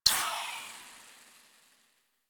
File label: Contagion End; Warframe; Saryn